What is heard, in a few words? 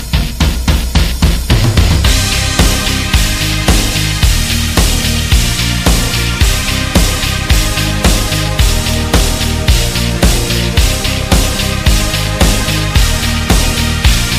Dance - Instrumental Song Ringtone for Android Mobile